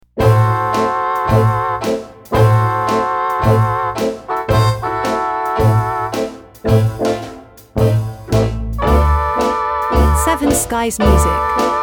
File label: Dixieland